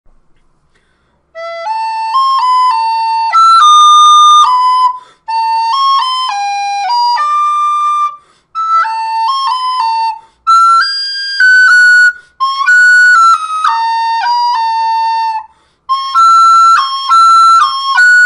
flauta mal tocada

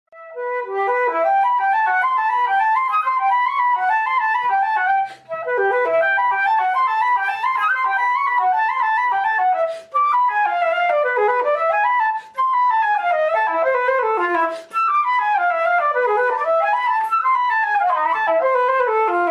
flauta funny

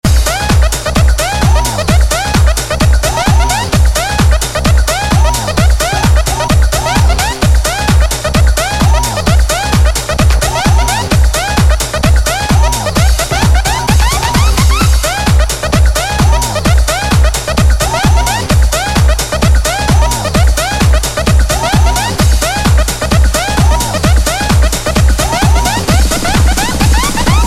666 alarma

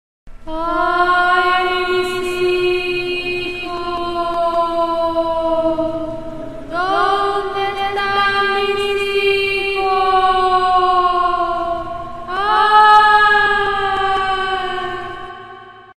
Sonido de La Llorona

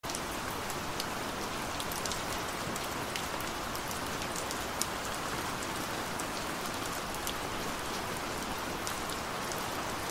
sonidos para dormir

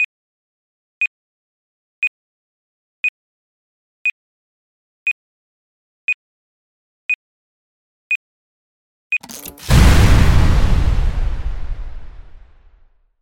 explosion efecto

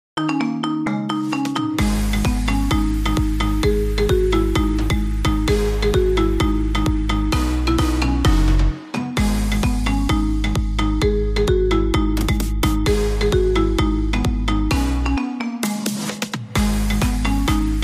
narco marimba

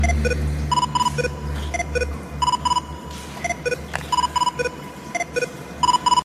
ringtone profesor

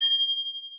iphone x campana

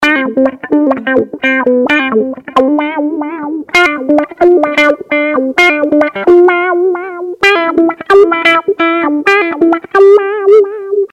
notificacion; oppo

